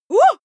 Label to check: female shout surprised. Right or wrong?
Right